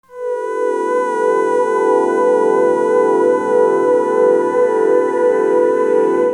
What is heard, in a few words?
Keyboard; Sonic Foundry (Sony Creative Software); Infinite Soul VOL-1; Keys